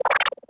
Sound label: hit chirp, droids robots